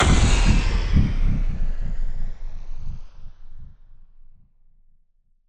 Impact

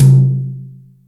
Brsh Rk Md, Acoustic Tom; Yamaha DTXpress

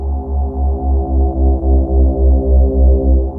JUPITER 8 E2, Rare Synthesizer; Hollywood Edge - Giorgio Moroder Rare Synthesizer Collection

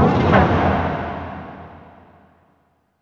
JETTISON, PERC; NorthStar - The Wizard VOL-2; TSAR PERC

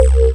Dance Mega Synth; Best Service; 90 s Sample CDs

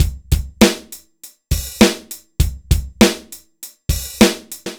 dry loop, BPM100

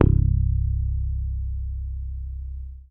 HOFNER SUS C1, Bass; Sampleheads; Will Lee Bass Library

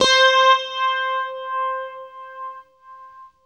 UNIVIB C 4, Guitar; East West; Steve Stevens Guitar Samples Collection

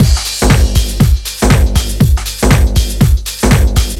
bungalow, Deep House 120bpm; Zero-G

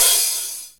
OHH, RSF DD-30

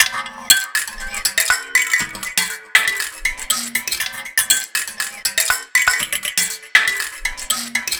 Finger Piano Loop, Ethnic Instruments; Sonic Foundry (Sony Creative Software); The Electronic Music Manuscript Collection

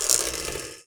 Food Mixer, household rhythm samples Hits

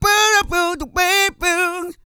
SING, Vocal Planet R&B & Dance; ILIO

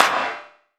Clap, Gated Reverb; creative gate; musicradar

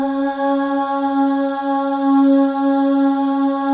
AH, FEM; Spectrasonics; FEM.2 AHS

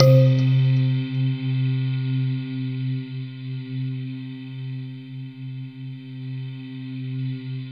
MALLET VOC, KURZWEIL K2000

